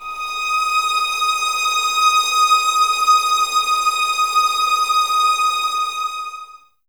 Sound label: VS D#6, 11 Violins, Mute; Miroslav Vitous; String Ensembles